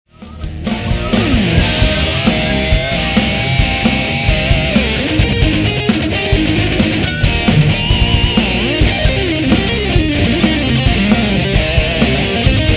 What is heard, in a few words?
Solo